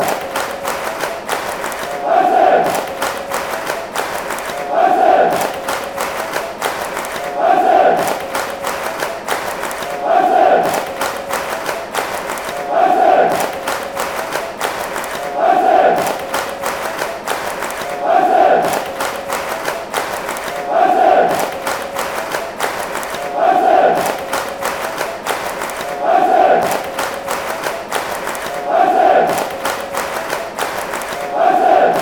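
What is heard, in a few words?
clap wednesday, football chants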